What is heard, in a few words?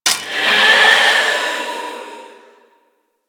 Grimoire Vulnerable Impact; Enemies